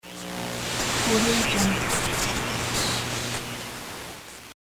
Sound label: tv static; kazoomx